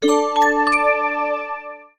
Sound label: silky alert